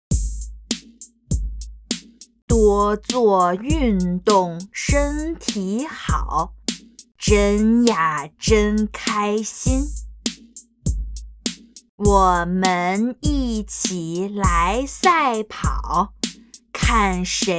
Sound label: Chant